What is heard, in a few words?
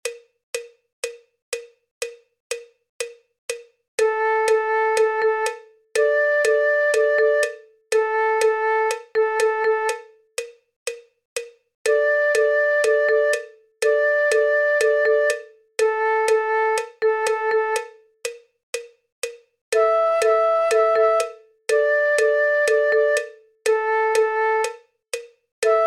blues play along; musikmentoren